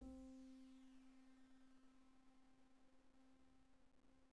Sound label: Single Note; piano; 12bits